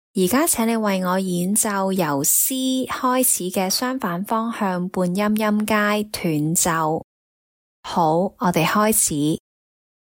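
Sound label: chromatic contrary motion scale starting C staccato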